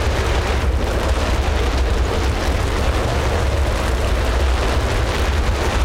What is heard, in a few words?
plane fire; War Thunder